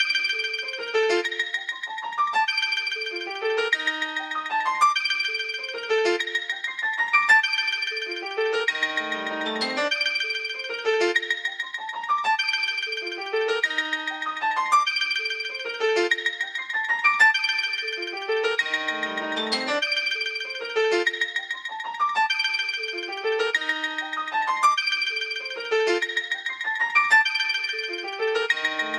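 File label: HTC set of 5 sounds standard HTC melody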